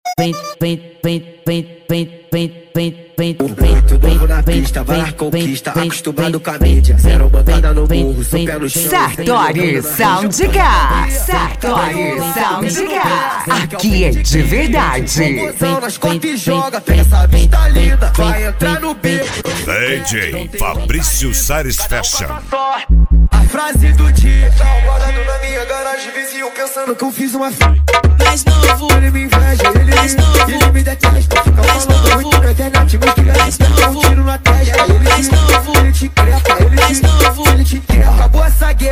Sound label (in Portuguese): sound car; reboque poseidon sartori